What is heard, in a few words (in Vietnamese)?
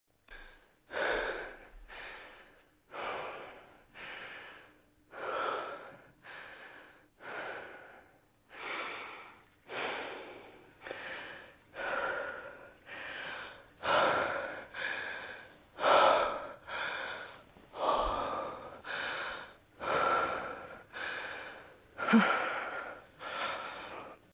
Tieng tho hon hen cua nguoi dan ong bi thuong